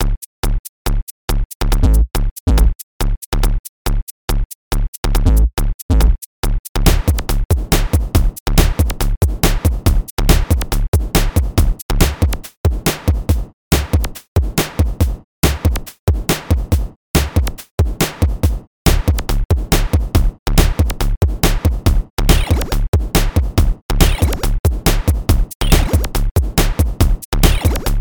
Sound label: eletronic